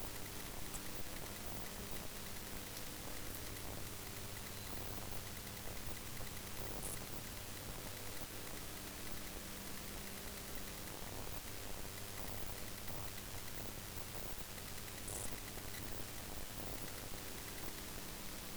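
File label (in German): Zwei unterschiedliche Fledermäuse; SDR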